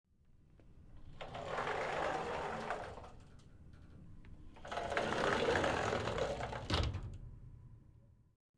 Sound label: Glass Shower Or Mirror Closet Door Slide Open And Close With Reverb